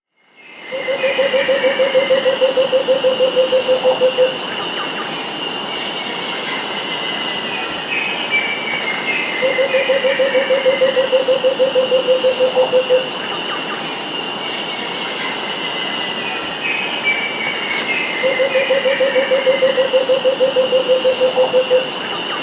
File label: Scarlet-hooded Barbet (Eubucco tucinkae); S. America; (location unknown)